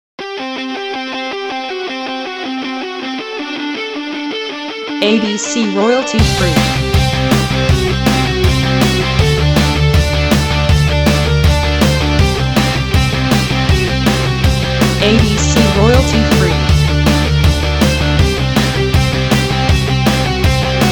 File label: 80s guitar hero